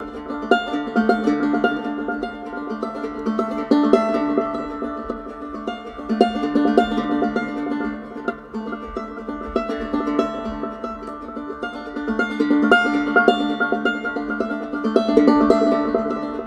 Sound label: banjo; Zomboid; EntertainYourself